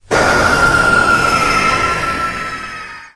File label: rat jumpscare sound; five nights at candys